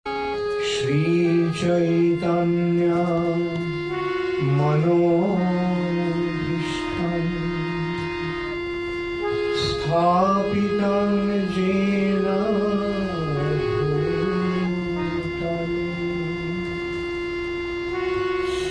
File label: Sri Rupa Pranama Sung; Pranam Mantras; HH Bhakti Caru Swami